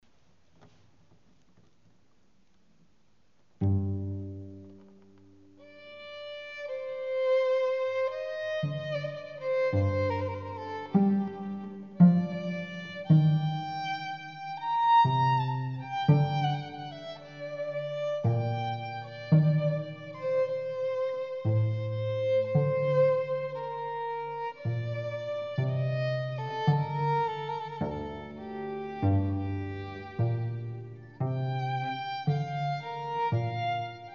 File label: Violin Sonate; H. Eccles